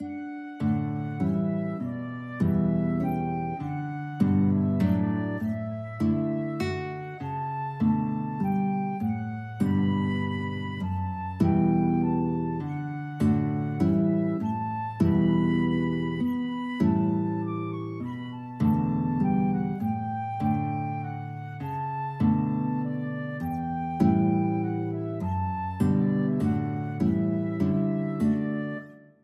guitar flute duet; hereford

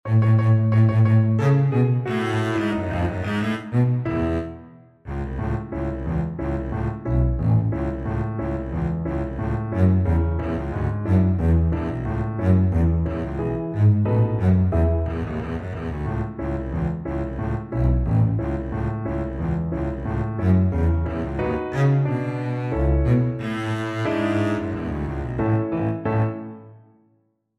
radetsky march, double bass

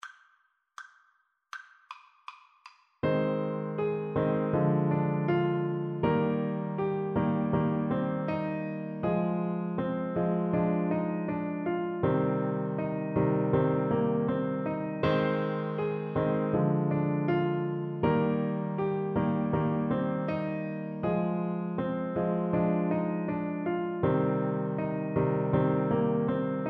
borodin polovtsian, Tuba

